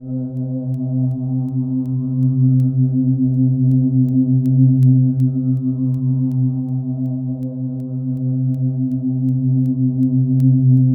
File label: C2, Synth Leads